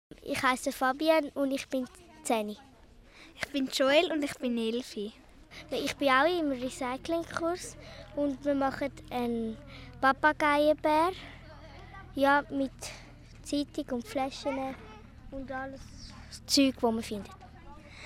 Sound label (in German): Recycling